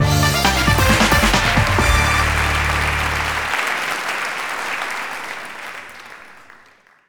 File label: sting applause; Buzz The Mega Quiz; ps2